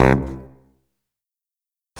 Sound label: Sax Hit; Sonic Foundry (Sony Creative Software); Electronic Point Blank; Drums & Percussion